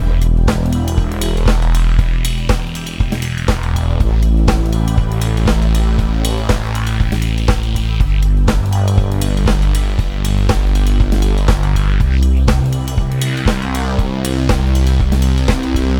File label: Music Drums Full; ps vita; Helvetica